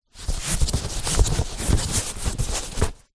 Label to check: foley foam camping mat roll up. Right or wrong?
Right